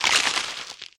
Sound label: scarab mob